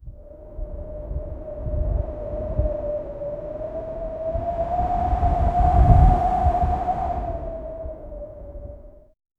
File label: loud wind blowing; gale